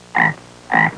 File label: Bullfrog croaking sound short